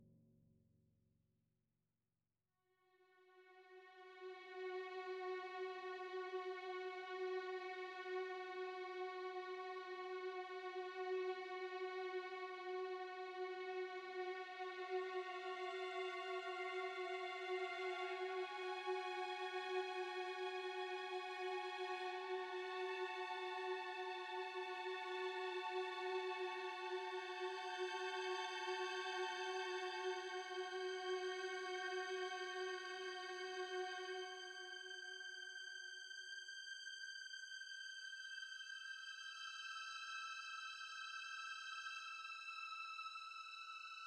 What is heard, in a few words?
strings